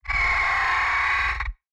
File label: mechanimal; sonniss gdc